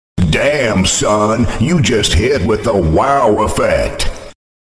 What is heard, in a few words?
Wow Effect